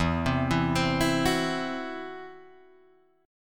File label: E Suspended 2nd Sharp 5th, Guitar, Drop D